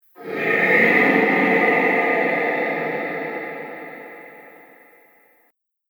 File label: Flaming Dragon; Monster Sound Effects; Fantasy